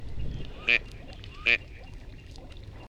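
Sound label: gadwall mareca strepera; seraya utka; utok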